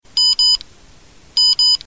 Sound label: RCX Quad Beep